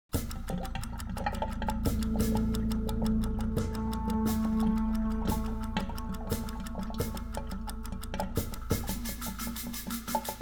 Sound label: 175bpm